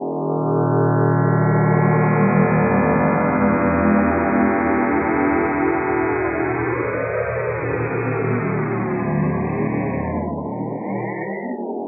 deathstar; Electro and Synthetic

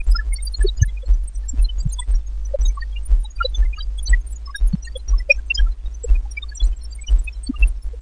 random analog bleeping; Electro and Synthetic

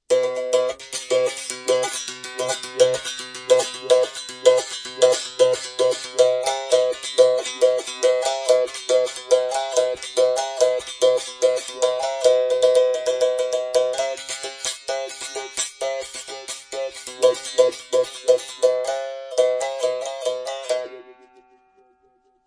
berimbau solo; WORLD

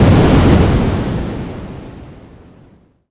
killed; warrior

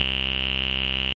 filtery synth bass; SYNTH KEYS

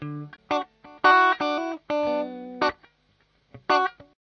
strat, Guitar

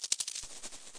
shuffle electronic paper, Electronic Hits

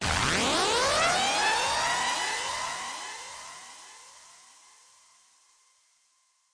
zipper synth fx; Electro and Synthetic

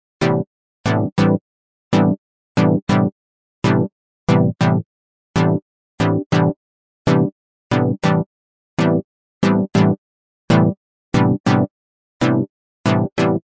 140 Vamp; 140 Telephony

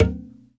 marimbula knock; Marimbura; WORLD

